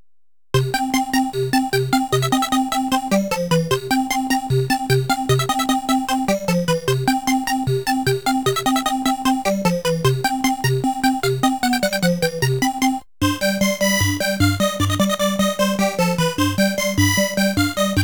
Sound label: Overcharge; Namco Graffiti 1; LIBBLE RABBLE